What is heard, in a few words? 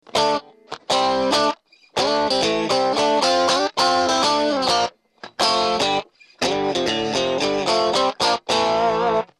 cable